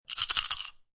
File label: Dice Shake Dice In Hand; Casinos